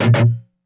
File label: Pick Chkk